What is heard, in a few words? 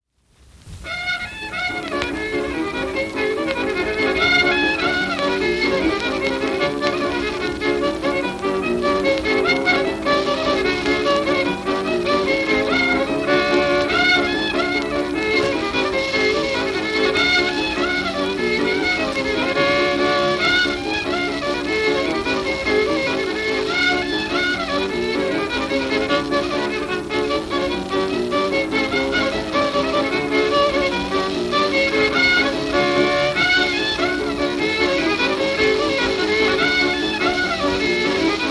Fiddle Song